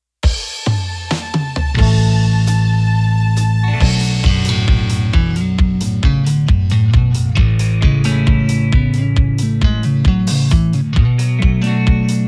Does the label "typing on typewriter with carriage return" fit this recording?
No